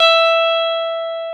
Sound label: GTR, Dual Guitar; Club-50 - Foundations Roland